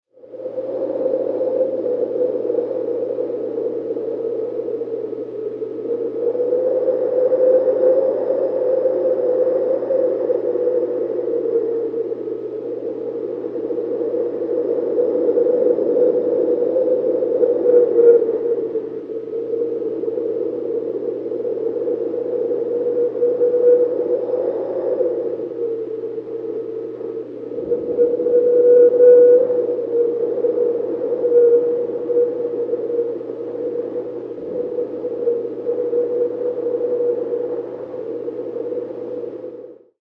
Howling polar wind SOUND EFFECTS antarctic cold air; Perim Rings; Sentry Towers